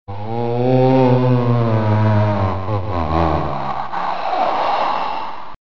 Moaning

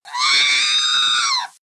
R2D2 Scream

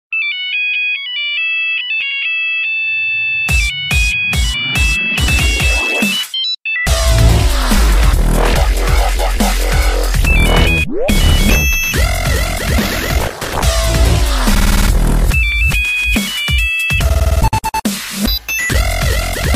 Dubstep; Nokia Theme

